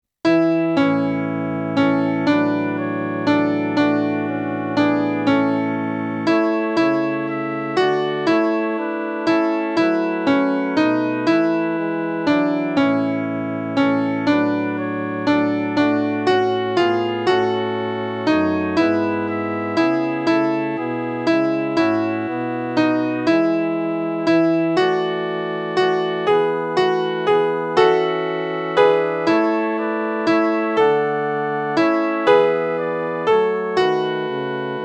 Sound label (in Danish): midi